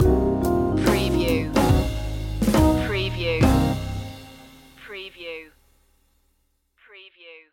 blue funk stinger

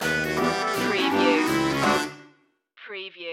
stinger

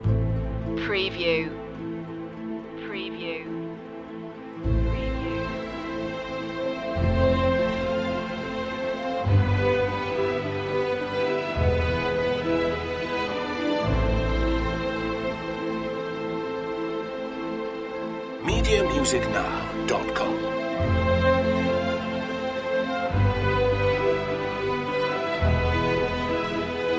arco end

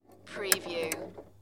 Pinball sound, ball roll and hit object midways

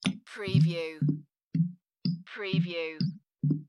Bottle Pour Liquid; Foley; sound-effects-bible

